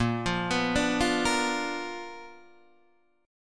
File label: B Minor Major 7th Flat 5th A sharp